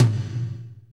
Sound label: tom, Power Ballad; Northstar; Drumscapes Roland